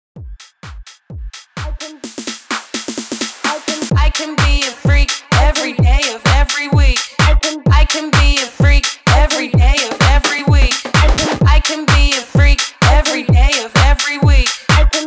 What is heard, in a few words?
HYPE 128 BPM